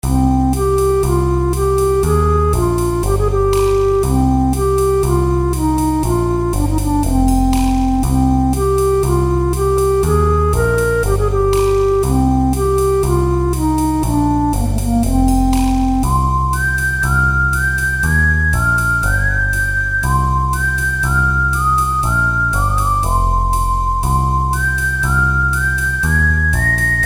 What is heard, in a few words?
Desert Theme